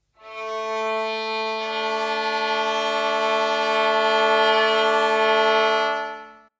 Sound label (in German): geige; Raga-Musik